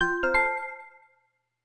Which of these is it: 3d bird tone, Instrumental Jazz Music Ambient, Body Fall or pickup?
pickup